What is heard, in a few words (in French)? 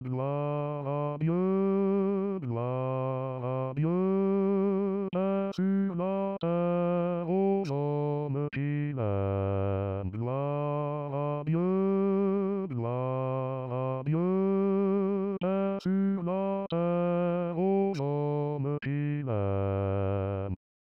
basse; messe de la trinite